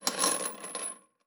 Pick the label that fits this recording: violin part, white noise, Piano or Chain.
Chain